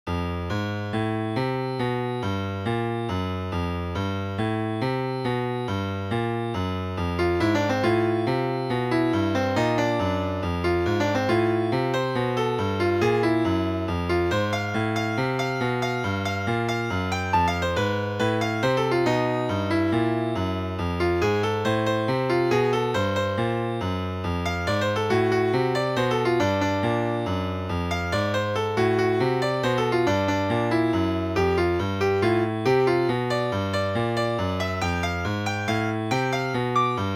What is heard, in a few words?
Jazz Ostinato